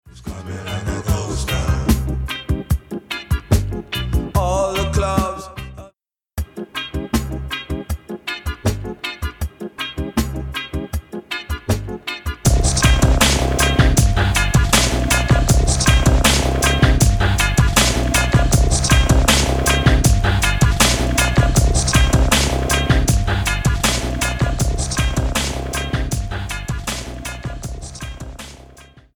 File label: Guitar & drums